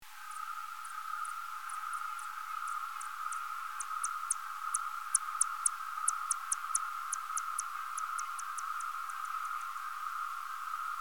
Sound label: Kerivoula papuensis